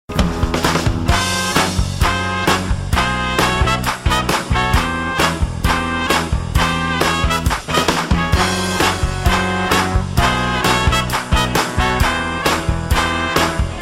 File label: trumpet riff; Jazz